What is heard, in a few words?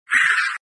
hit; shouLieGaoShou350